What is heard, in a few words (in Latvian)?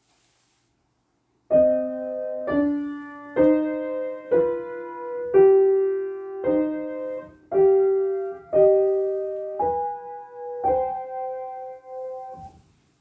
Intervālu sec. do minorā; Mūzikas mācību priekšmetu pedagogu veidotie metodiskie materiāli